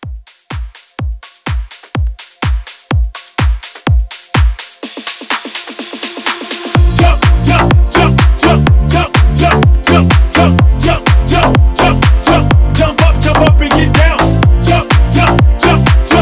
House Classic

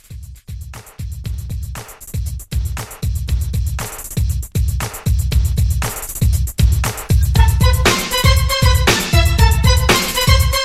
80s Dance Pop

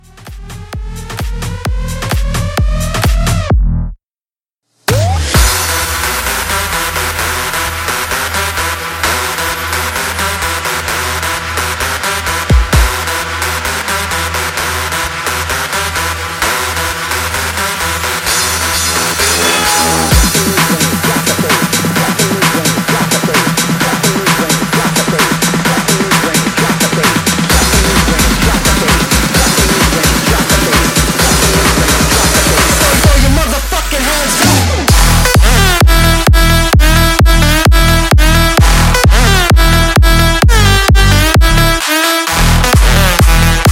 Hands Up Hybrid 130 DIRTY

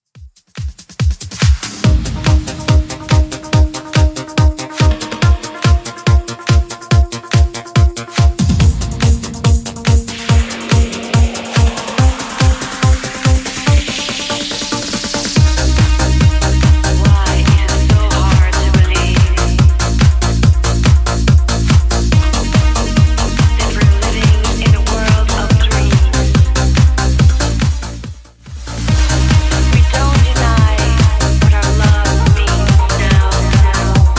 90s Redrum 142bpm

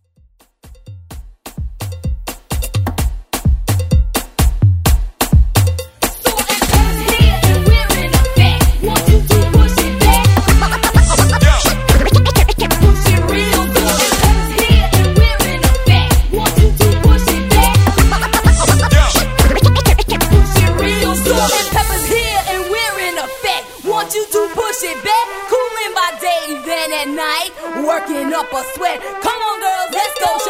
Afro Hype Scratch Edit

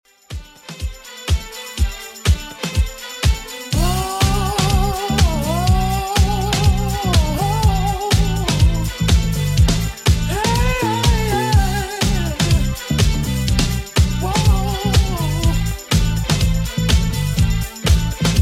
80s 123bpm; Redrum

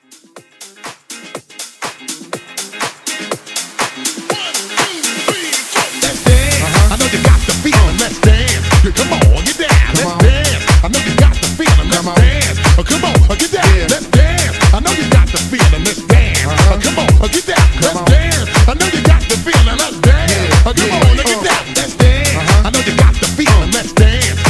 House Club 122

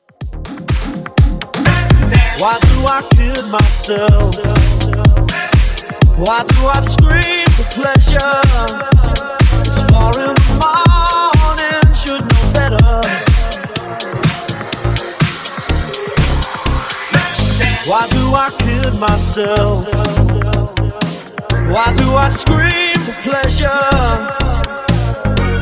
Funk 124Bpm

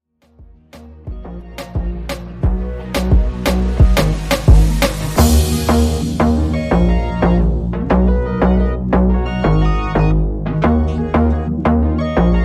reggae; Redrum

